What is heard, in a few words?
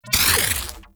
Corruption Deposit Start; Warframe; Missions; Zariman